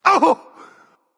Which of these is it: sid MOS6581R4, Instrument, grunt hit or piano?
grunt hit